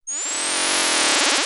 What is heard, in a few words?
strange